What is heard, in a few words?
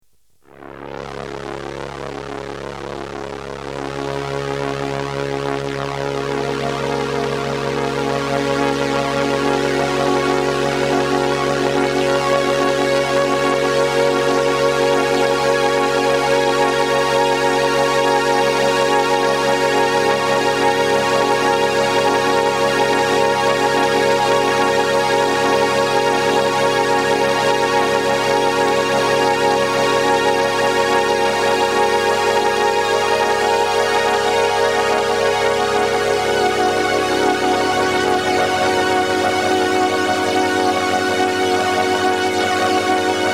hold, polykorg